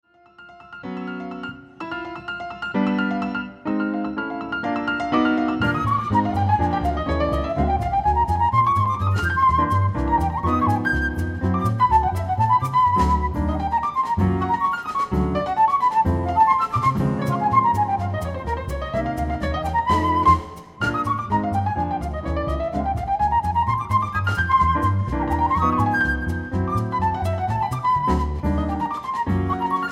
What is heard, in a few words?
Jazzy